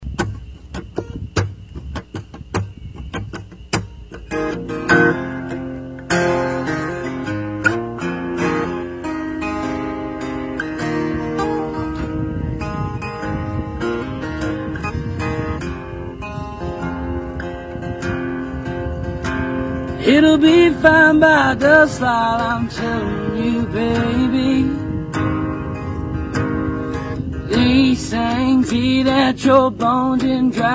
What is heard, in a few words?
music; country music; AM 1340 KXEO